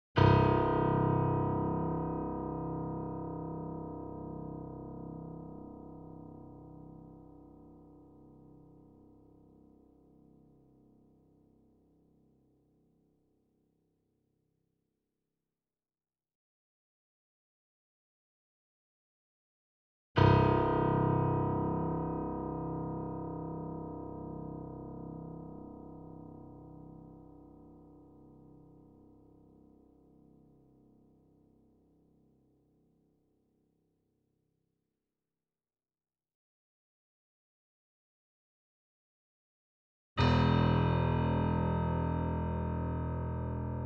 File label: C1 E1 A1 Grand Piano & Dig Piano; KORG; Sound Sampling Collection VOL-3; Grand Piano 88